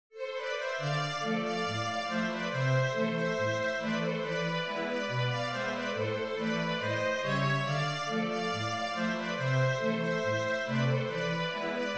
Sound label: basso full, viola